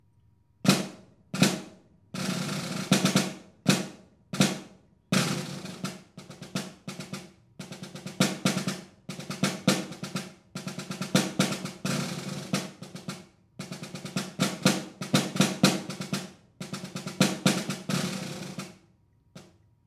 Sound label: perc ense cycle snare